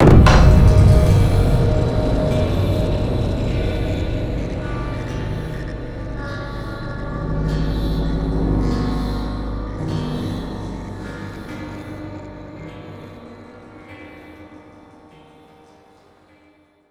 Spider Round End; Zombie Island

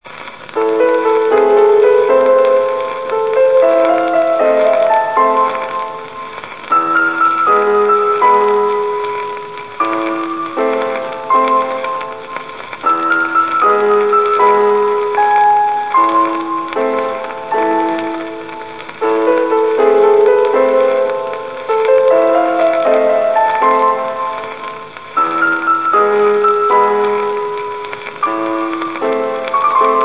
Piano Static; Art is Dead

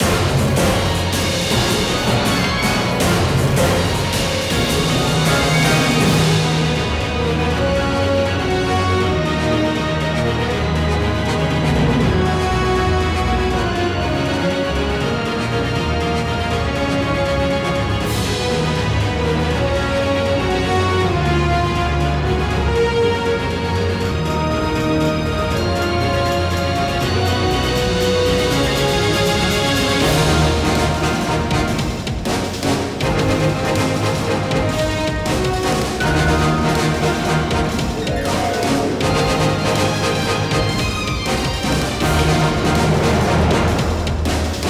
Dungeon

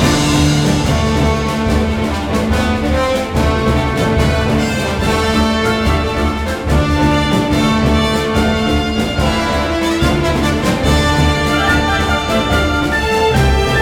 Normal Battle Loop

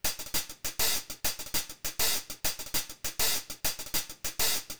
Main Level Intro Drums; Seline

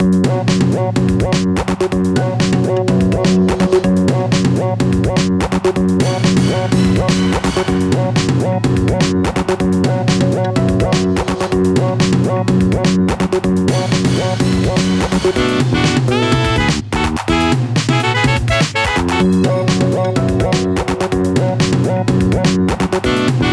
High Alert; Witcheye

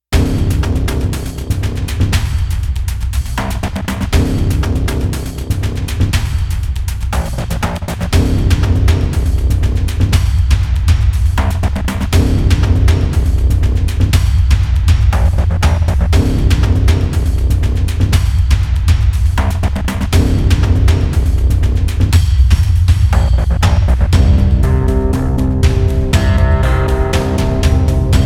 Cave Music

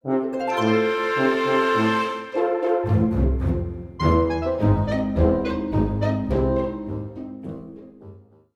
Music Trick or Treating; Costume Quest 2; Orel

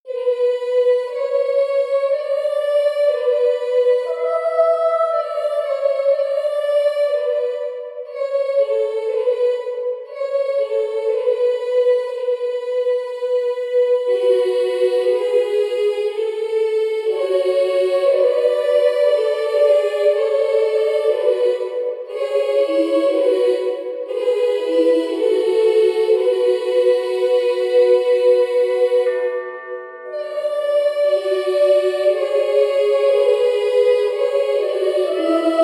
Dungeon

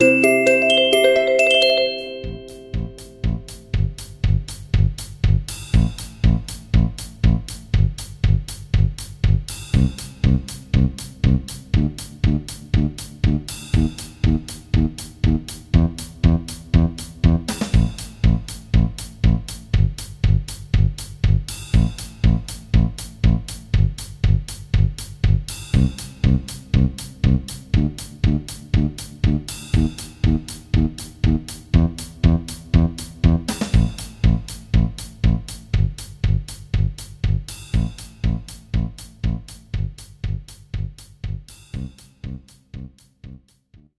You Win; The Black Cube Shooting Game 2

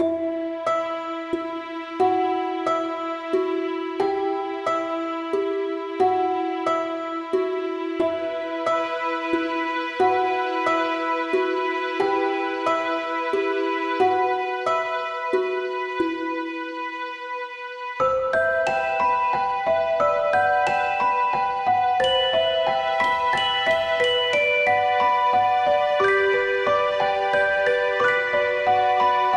Music Box; The Hero of Time

